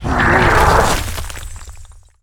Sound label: Ice Rager Attack